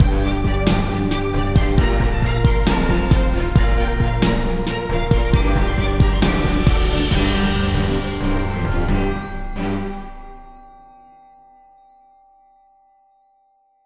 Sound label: Intense Symph